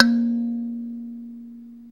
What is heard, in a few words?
KALIMBA C2; ETHNICSTUFF